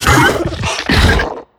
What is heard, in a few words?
minion run chatter; Attack of the Radioactive Thing